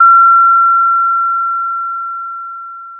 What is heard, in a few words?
Sound of a school bell